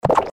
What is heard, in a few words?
short squacks